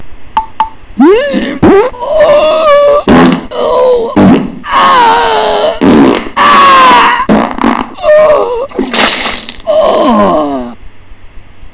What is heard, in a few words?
oh yea fart